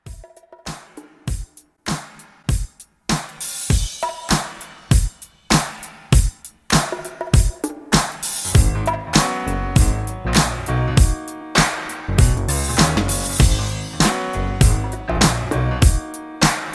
80s RNB Re Drum 99 CLEAN

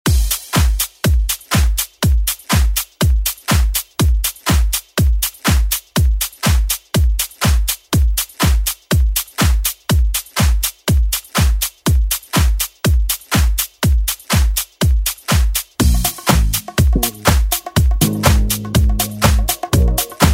70s Club ReWork

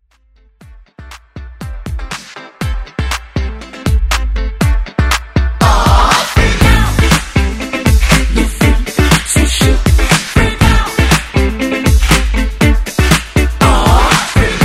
Funk Edit

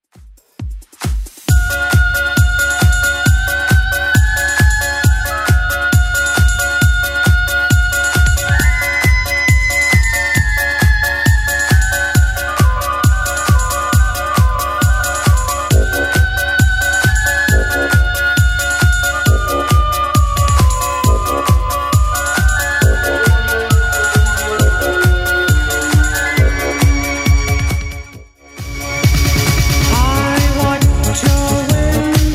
80s Club Edit 135bpm

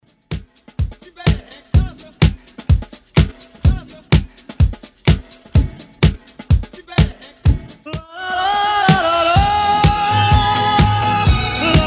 70s Bmore 126bpm; Redrum

